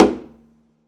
pizz noise body slap high, double bass; dsmolken; noises

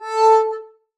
arco a4 ff up, double bass; dsmolken

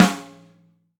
Snare Hardest, hydrogen drum samples

